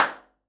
Bath Hand Clap, Sennheiser MKH20 omni; Eburne Bath

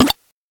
flash

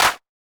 clap jp8 crunchy

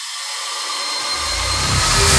Medium Sweep Scary; KSHMR

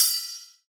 808 Ride; Cymatics; 808 Mob

